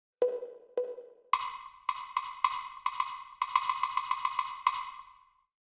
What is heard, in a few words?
rhythmic dictation